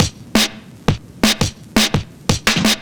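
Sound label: Drum and Bass Beat 170, drumnbass 170bpm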